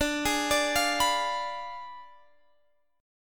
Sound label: D Diminished 7th D, Guitar, Open G